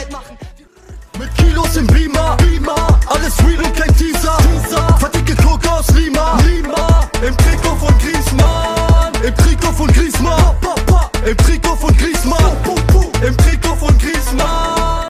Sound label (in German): deutsche rington